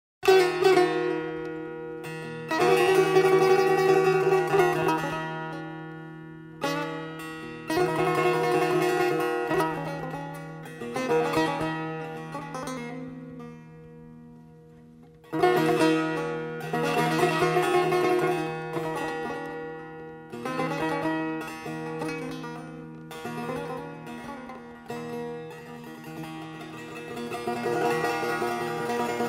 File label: music tar azari